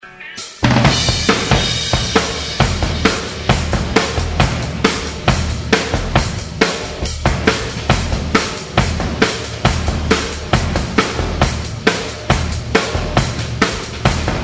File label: Drum outtakes